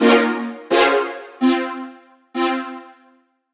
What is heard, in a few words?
lead, Melody Loops; TRUTH-HZ; Crowdsourced Sample Pack